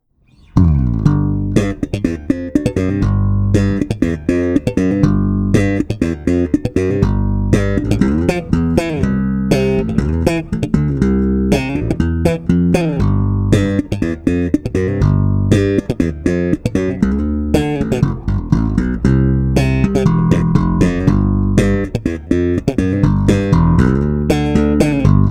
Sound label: SLAP DIRECT